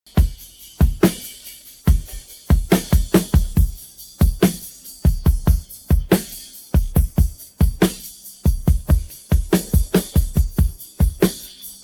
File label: drums pattern